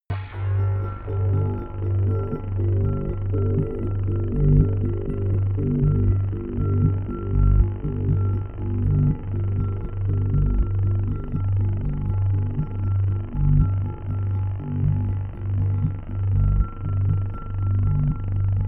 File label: sequence bass bell